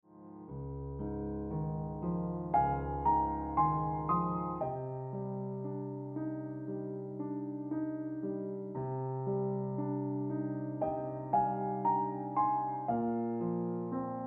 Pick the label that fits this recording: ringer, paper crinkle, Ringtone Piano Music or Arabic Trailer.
Ringtone Piano Music